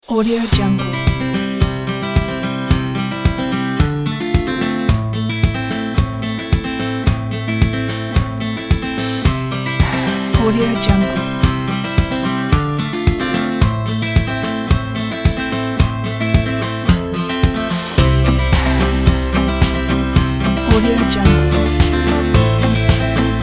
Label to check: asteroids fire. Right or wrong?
Wrong